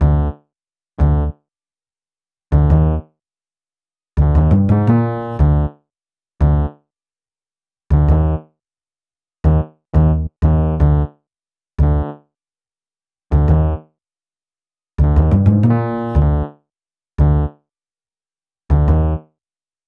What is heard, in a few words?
Bass, Hip-Hop